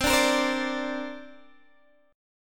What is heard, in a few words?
C 7th Suspended 2nd, Guitar, DADGAD